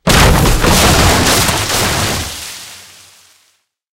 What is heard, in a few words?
wood mid